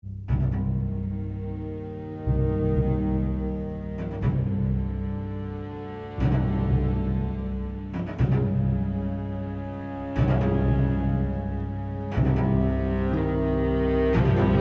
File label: Scramble Music